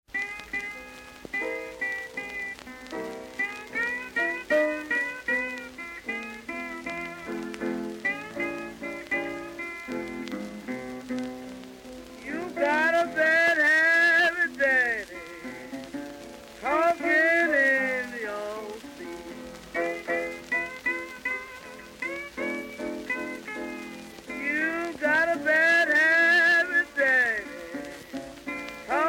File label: Sleep Talking Blues